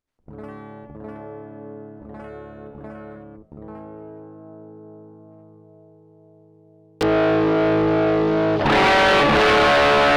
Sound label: Bridge Various Settings On Off Dropped D